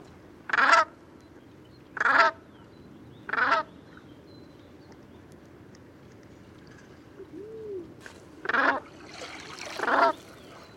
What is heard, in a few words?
Brant Goose Branta bernicla hrota